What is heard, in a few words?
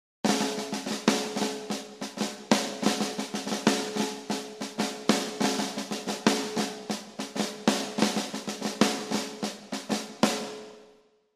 flams